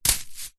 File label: hacky sack footbag catch hand